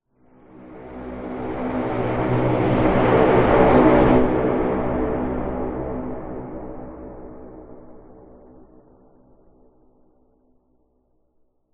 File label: sound design lfe airy blowing pipe dark big reverb scary